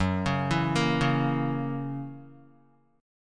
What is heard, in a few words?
Gb 7th Gb down Guitar; arpeggiated; JGuitar